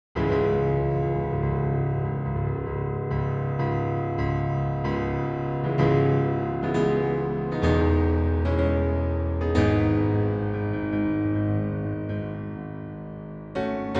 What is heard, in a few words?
Piano; composing sound design